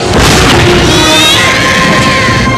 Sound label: death